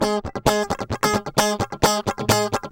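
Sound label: guitar, 130 BPM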